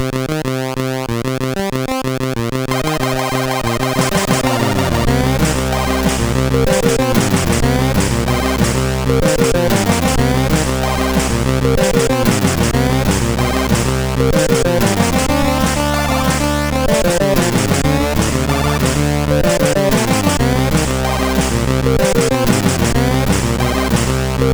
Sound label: Music, CSG8580R5